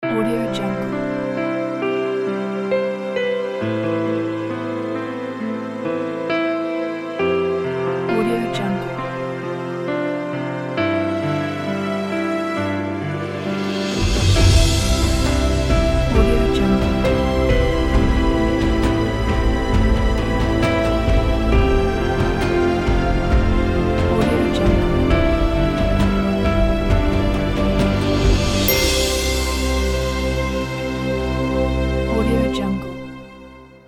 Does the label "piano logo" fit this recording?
Yes